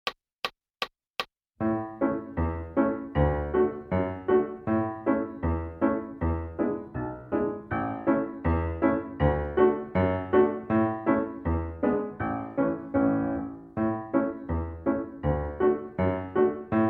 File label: Piano; Boil 'em Cabbage Down